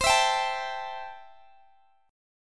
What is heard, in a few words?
C 6th, C, down, Guitar, Open G